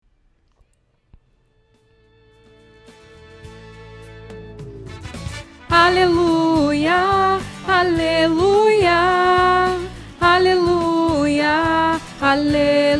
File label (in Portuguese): Aleluia soprano; jmj2013